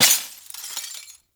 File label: Desktop Glass Destroy; Warframe; Ambience; Entrati